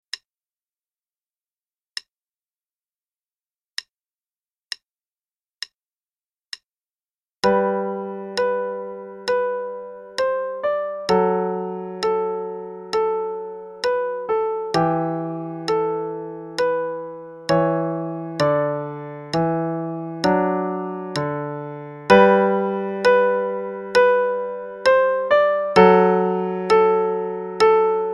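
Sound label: Play-a-long, March, quarter note=66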